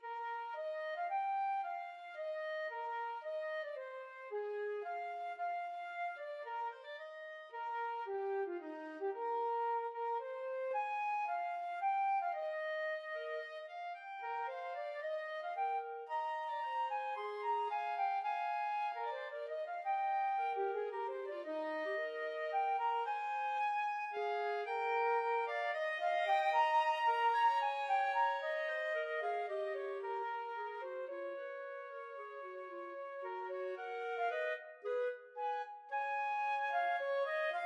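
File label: Banks of Green Willow, flute clarinet duet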